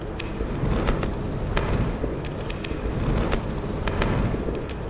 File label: clicking gated noise, looping ambience